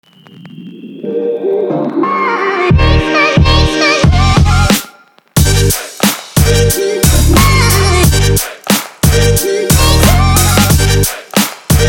SPORT ACTION ENERGETIC FASHION HIP HOP SHORT VERSION